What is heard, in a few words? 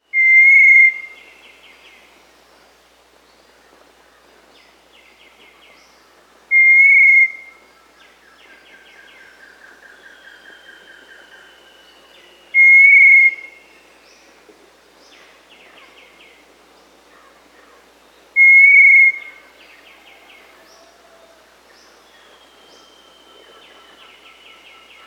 Little Tinamou (Crypturellus soui), Peru, Bolivia; S. America